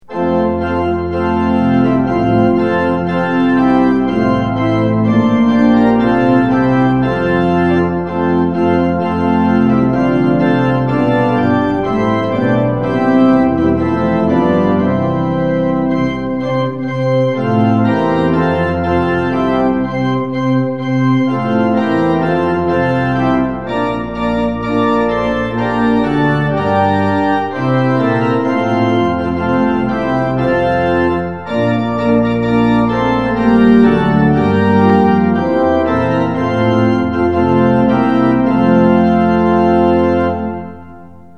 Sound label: tune; hymns; lillenas